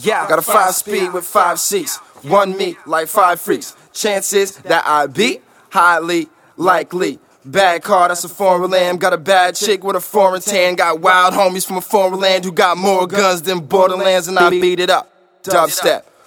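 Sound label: hip hop 130 E flat minor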